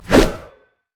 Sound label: basicattak; LoL; fiddlesticks